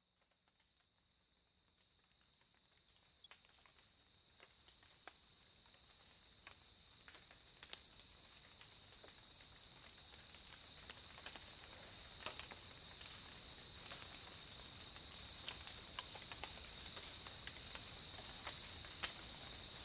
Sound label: Tropical Rain, Sounds of Nature; Relajacion